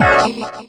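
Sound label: chord organ, Stabs, House; E Lab; XTc Files of House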